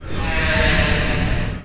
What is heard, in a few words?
mana burn